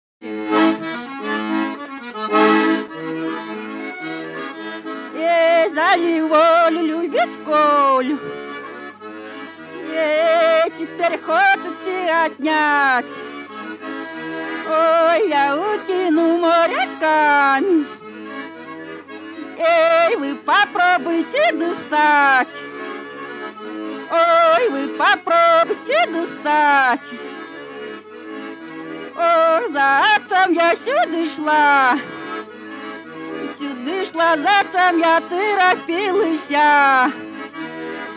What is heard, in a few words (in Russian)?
свадьба; поозерье; знали оля любит колю; Фольклорный фестиваль имени Ольги Сергеевой